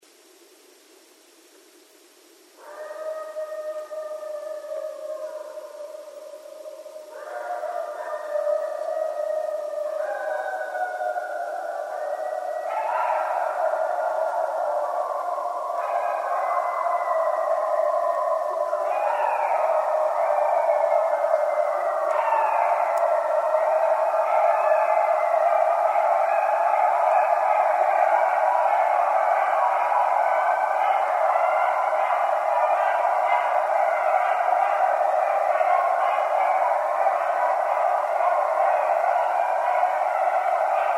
Coyotes; Coyotes (Canis latrans)

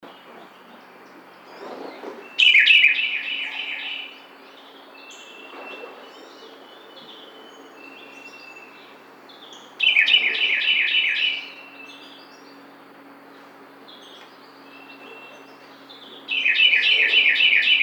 Carolina Wren

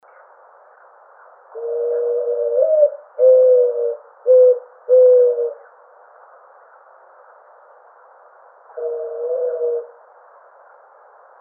Mourning Dove, birds

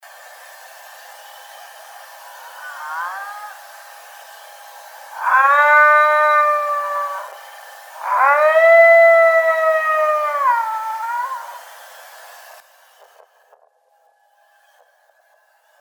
Illinois Howl; bigfoot

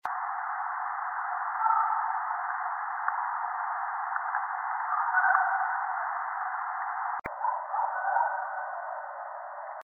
voice, bigfoot

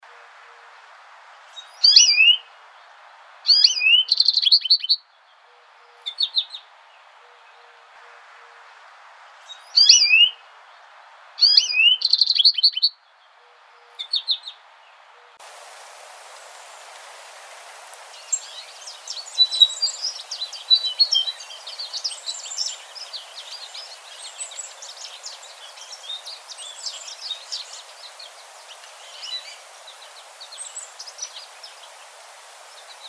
American Goldfinch

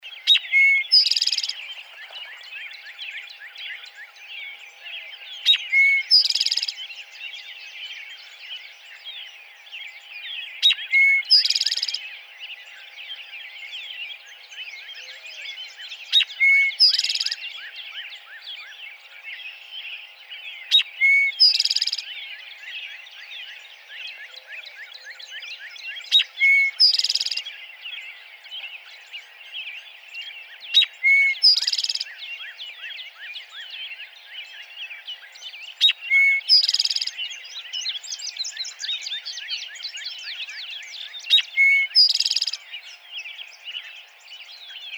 Eastern Towhee

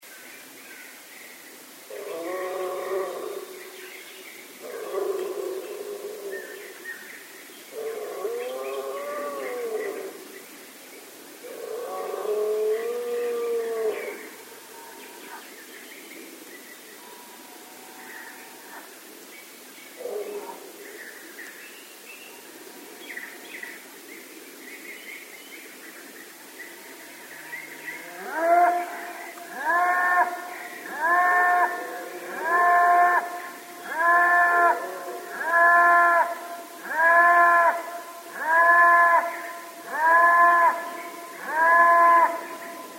Cattle

